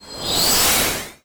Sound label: Water Buff Cast